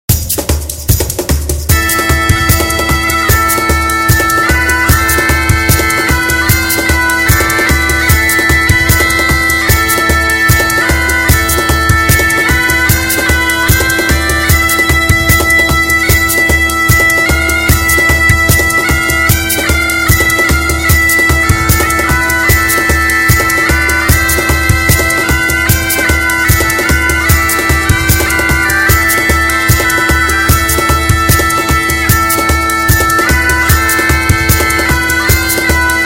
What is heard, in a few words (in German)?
Akkustisch